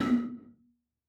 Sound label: Bottle Blown